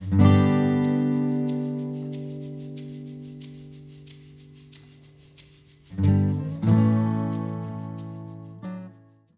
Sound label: GTR AFTER; Spectra